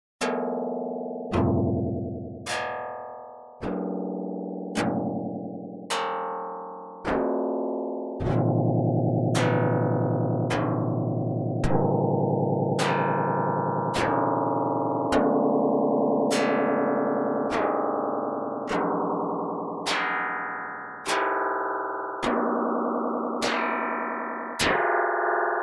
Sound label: Lyre Electric